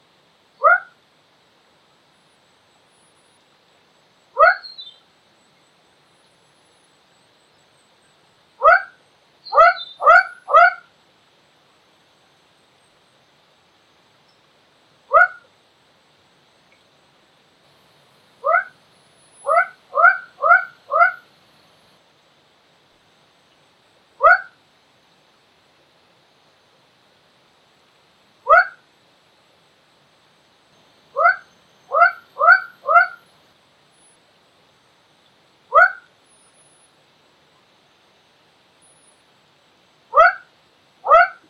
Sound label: Quacking Calls composite Kledang Sayong Forest Reserve Ipoh Perak Malaysia